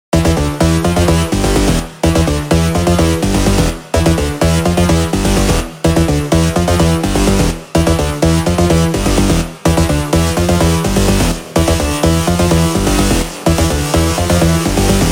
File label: melodic techno